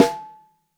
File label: SN3 Top, snare; Ableton Foundation FreePacks; Snare Designer